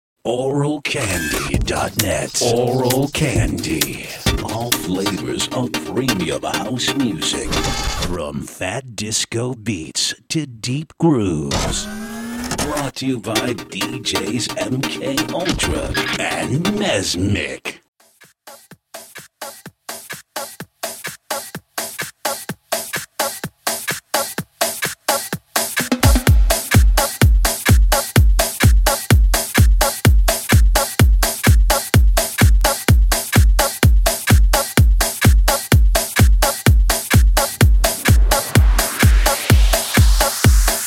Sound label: rhythm train